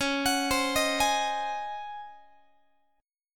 C sharp Major 7th Suspended 2nd Suspended 4th, Guitar; C#M7sus2sus4 arpeggiated; x,21,21,22,19,19; JGuitar